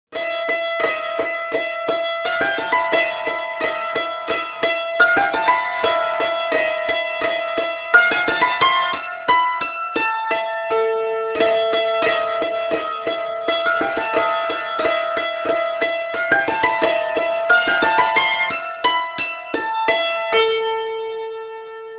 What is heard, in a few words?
cymbalum